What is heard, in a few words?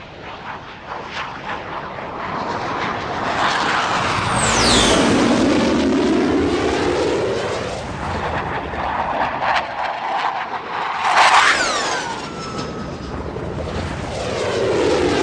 jet flyby